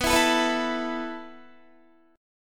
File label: B 7th Suspended 4th B down Guitar